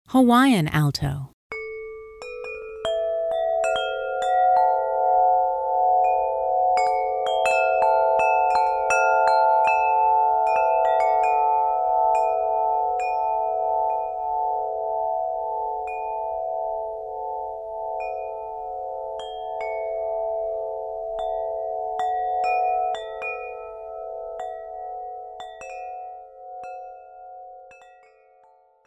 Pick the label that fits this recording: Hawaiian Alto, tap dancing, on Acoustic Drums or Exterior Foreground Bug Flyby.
Hawaiian Alto